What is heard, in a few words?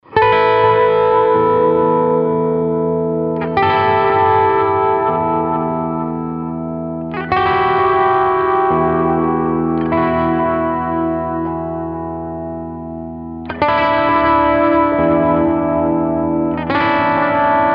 Delay; TubeDreamer72; Dyna-ssoR; Delay Llama